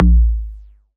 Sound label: Kick